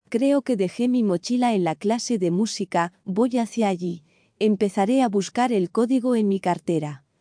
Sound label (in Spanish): musica